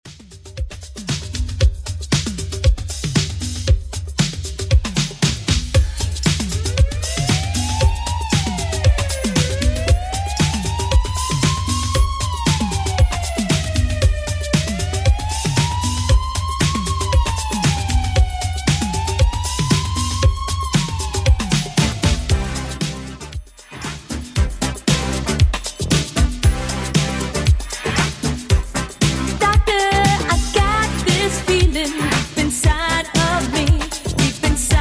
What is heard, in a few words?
80s Redrum, 116 bpm